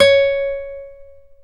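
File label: classical guitar, nylon string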